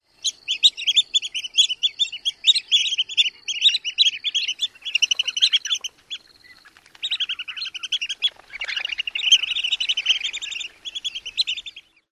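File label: Long-billed Dowitcher, various calls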